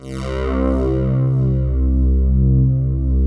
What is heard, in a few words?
PULSATN, PULSE; PULSENSE